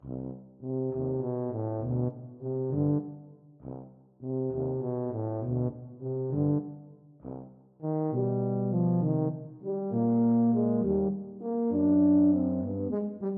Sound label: marionette TBA, tuba duet